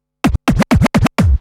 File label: Scratch Fills 140BPM; Vengeance - Essential Clubsounds Vol.4; Scratches